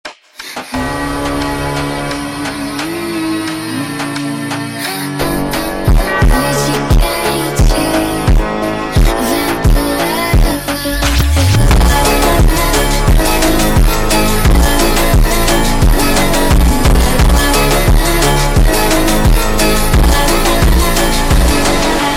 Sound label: Funk Sped Up